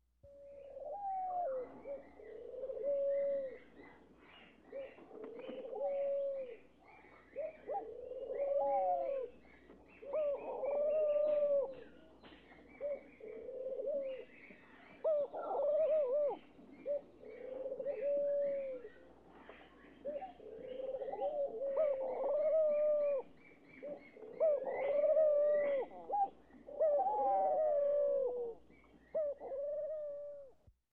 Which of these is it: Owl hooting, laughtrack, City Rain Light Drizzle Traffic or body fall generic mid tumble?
Owl hooting